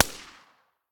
silencer p99 mono far, weapons

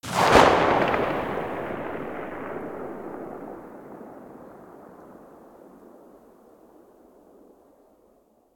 mosin muzzle mono far, weapons

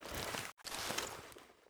pistol torch use; STALKER; IWP; item usage

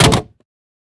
pistol drop wood; STALKER; IWP

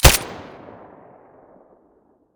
silencer glock17 stereo

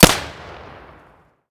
pm muzzle mono distant

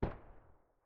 ump45 silencer mono far

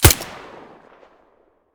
silencer fiveseven mono, weapons

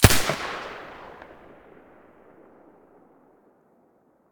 silencer mp9 mono, weapons

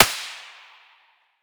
svu muzzle mono far, weapons

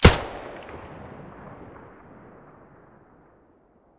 aps muzzle mono; STALKER; IWP

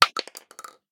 Shell 12ga wood; STALKER; IWP

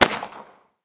m1911 muzzle mono far, weapons; colt

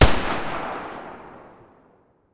muzzle spas12 mono distant

